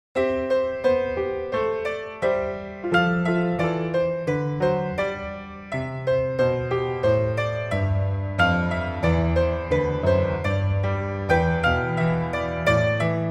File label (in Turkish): Piyano Solo